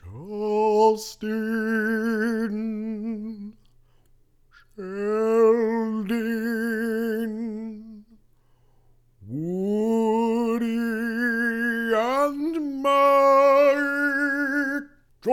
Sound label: Opera